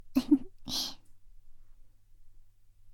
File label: laugh, ASMR; Garden; WithRin-Nazo01